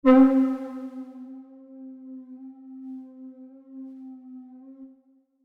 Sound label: Synth One Shot